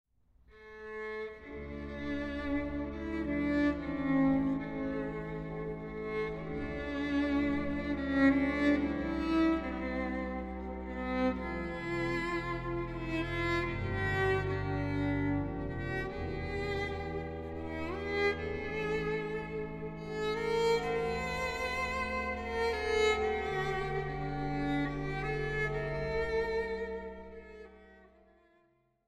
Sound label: Greenleeves